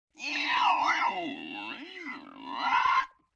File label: LoFi Synthetic Bird Caw; Vintage Cartoon; Comedy Vocals